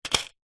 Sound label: box card small safety pins lid off set down; household